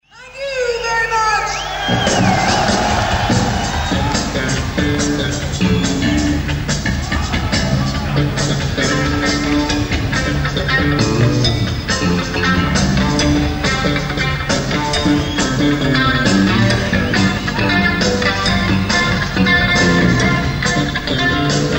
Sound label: rush dance groove